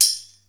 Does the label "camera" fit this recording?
No